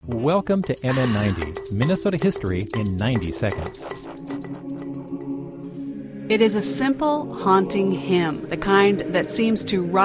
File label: Choir; St Olaf Choir